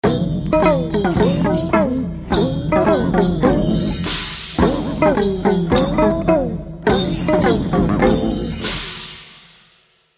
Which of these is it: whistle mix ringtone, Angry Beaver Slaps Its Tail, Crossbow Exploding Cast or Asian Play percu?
Asian Play percu